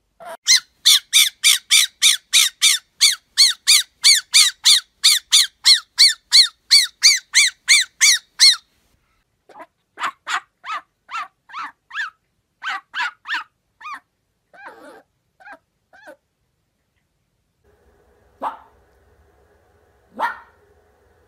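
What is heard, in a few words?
fennec fox; lisitsa fenek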